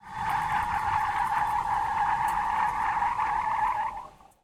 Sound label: skid asphalt; Blind Drive